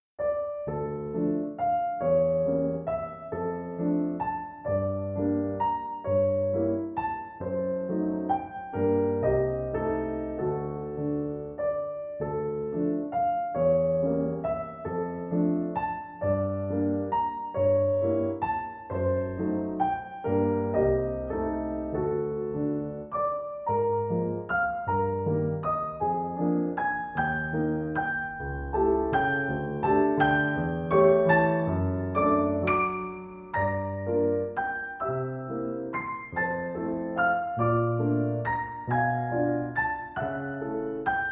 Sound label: Waltz No 9 Op 39; Johannes Brahms (PD)